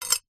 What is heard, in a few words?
household jewelry necklace metal down on glass; zapsplat